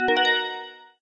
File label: Sfx - Combo; Farm Mania 2